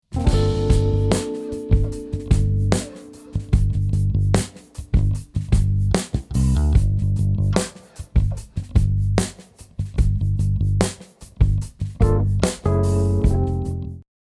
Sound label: drum recording, slow funk, funk drummer; schlagzeuger; drumstudio hamburg